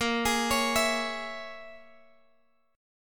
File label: A sharp Minor 7th Flat 5th A sharp, Guitar Open G